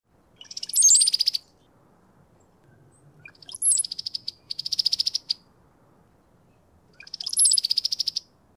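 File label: Cowbird Brown Headed Pair, Birds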